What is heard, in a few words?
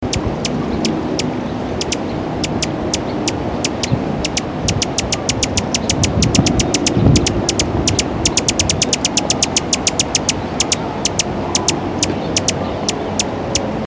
Allens Hummingbird